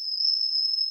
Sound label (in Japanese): suzumushi